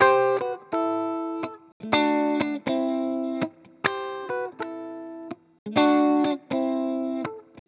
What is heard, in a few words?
ELEC GUIT ETHNO 125 A, Guitar; eJay